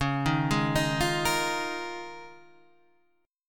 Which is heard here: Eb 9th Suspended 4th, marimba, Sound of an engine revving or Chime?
Eb 9th Suspended 4th